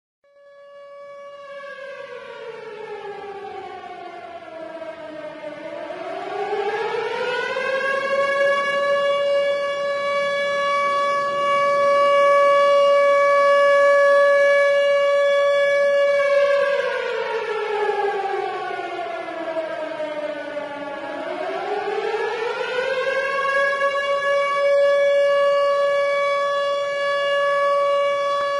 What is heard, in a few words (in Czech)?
zvuk sireny